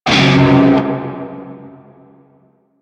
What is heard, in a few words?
Shawzin Hirajoshi Chord; Warframe; Narmer Shawzin